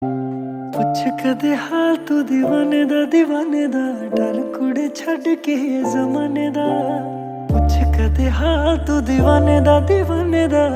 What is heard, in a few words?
Ringtone